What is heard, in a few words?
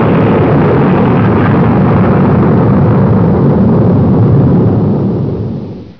takeoff; hurrican